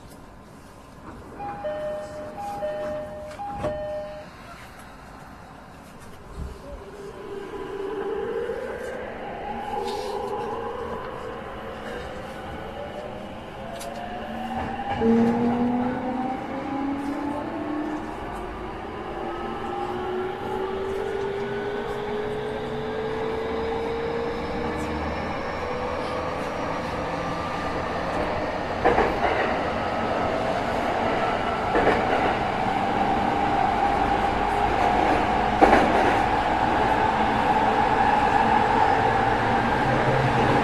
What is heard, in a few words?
chuo tozai E231-800, train